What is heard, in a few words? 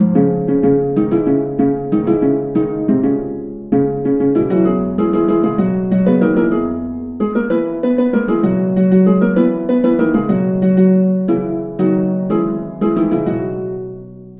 Harp; The Bard's Tale Remastered Trilogy